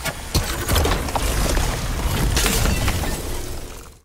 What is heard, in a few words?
melee attack; cryoclasm